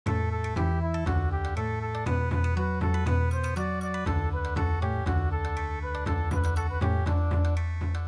Bon Odori; harvests festival song

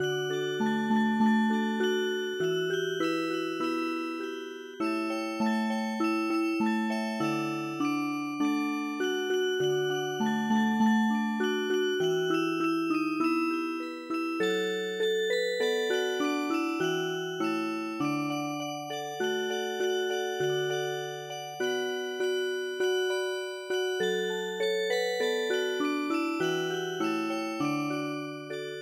Music Box, traditional

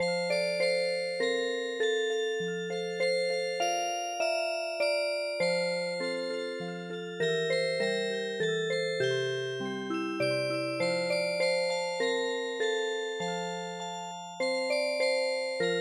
waltz Music Box; traditional

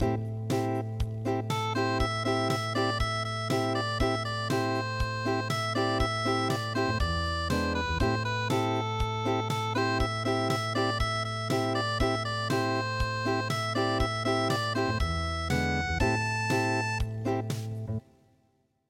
unpluged, midi

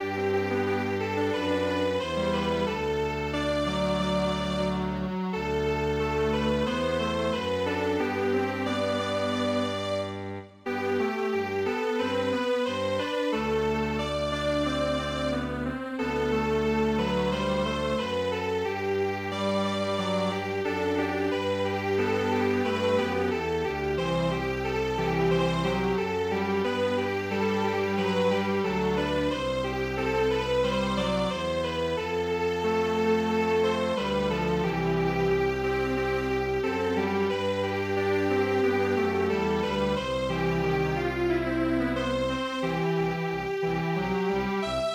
Strings Quartet

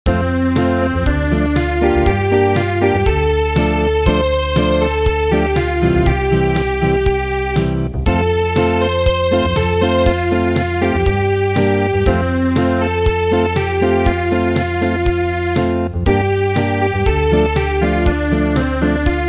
Acoustic

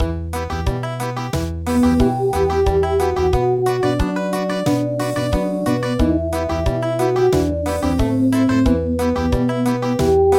Country, midi

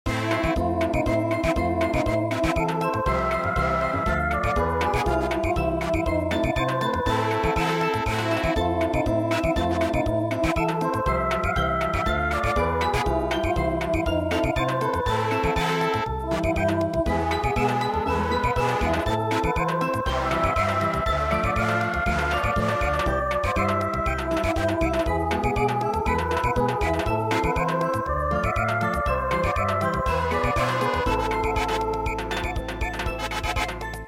Samba; daichi ni hana ga saite iru

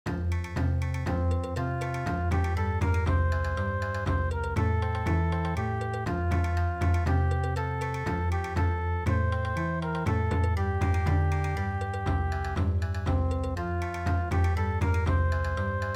Bon Odori

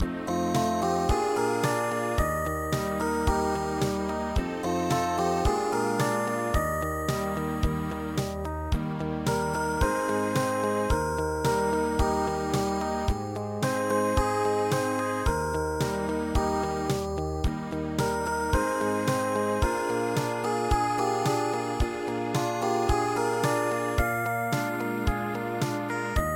ballade

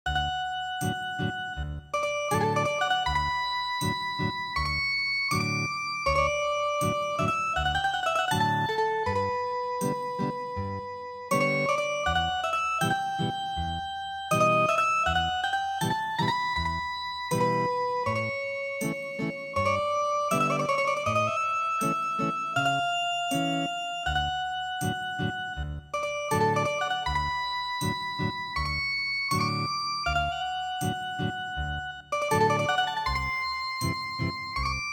Meditation Ethnic Guitar; midi; traditional

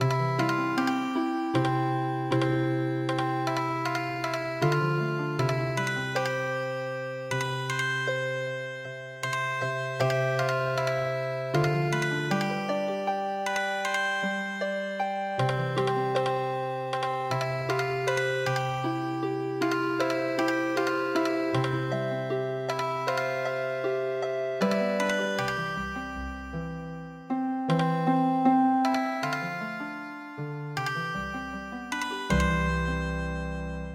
Healing Harp, midi; Miyako komori

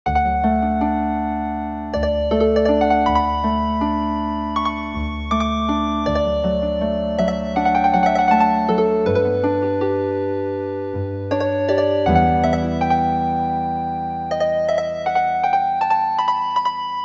Meditation Healing Harp; midi; traditional

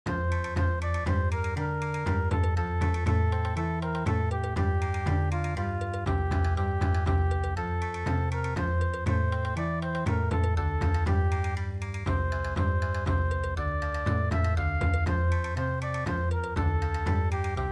Bon Odori, midi

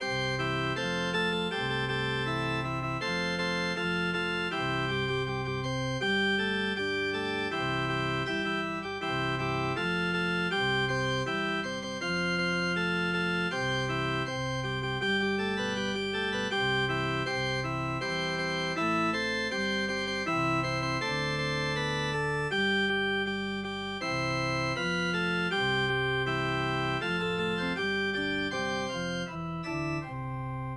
mushi organ

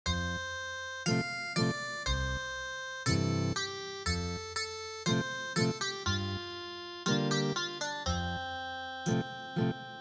Ethnic Guitar, traditional

